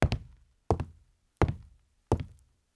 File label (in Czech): chuze drevo; Mafie 1 cz